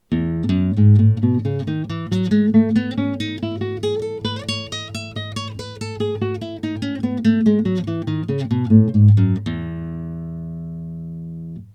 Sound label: Classical guitar scale